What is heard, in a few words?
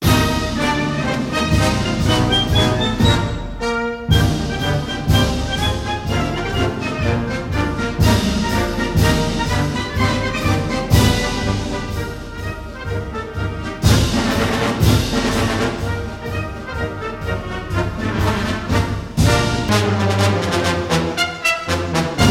Bass Drum Cymbal Etude